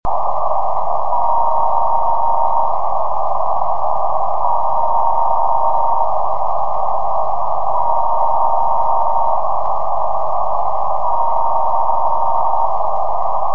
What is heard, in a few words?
cw; rs15; On June 14th 2009 at 08:20 UTC Henk PA3GUO recorded the CW beacon of RS15.